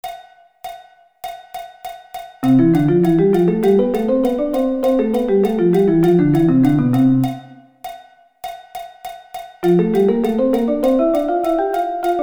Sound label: Thirds